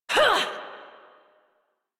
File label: Exert, Adult; New War; Melee Swing; Operator